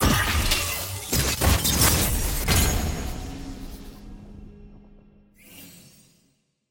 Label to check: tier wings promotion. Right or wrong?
Right